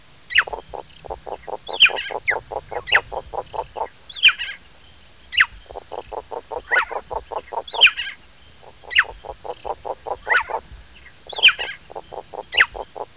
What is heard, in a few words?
bird and frog